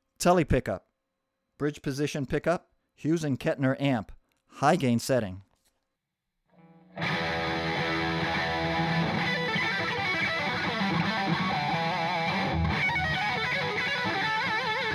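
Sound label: tele bridge high, guitars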